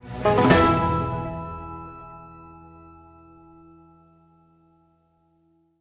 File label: stinger Power Up Room